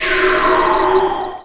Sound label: rally wait; Earth and Beyond; EA Games